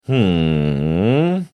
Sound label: drawn out hmm